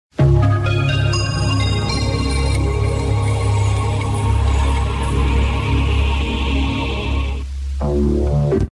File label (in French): Jingle; RFM; Decro programme local